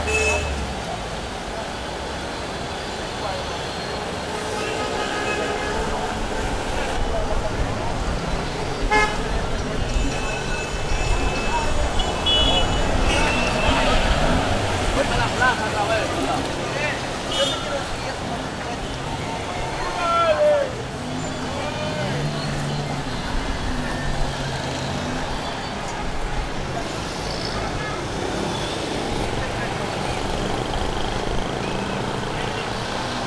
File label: city caribean, street traffic; best service Blue Box